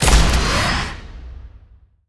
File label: chngun